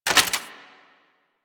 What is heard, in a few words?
Fist Un Equip; DE; Warframe; Weapons; Archwing; Melee; Grn Arch Regor Fists